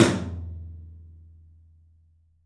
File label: 13 TOM, 3D Drums; 3D Sound